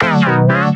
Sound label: Stab; neuro; InsideInfo